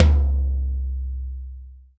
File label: long tail reverb tom single hit c key